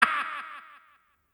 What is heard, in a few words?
laugh, announcer; open fortress; tf2cciv